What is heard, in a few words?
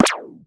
Speedy, cartoon